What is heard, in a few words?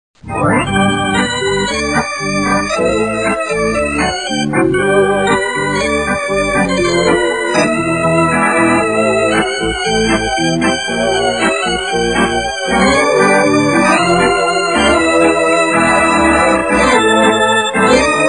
Harpsichord Theme; Red Dwarf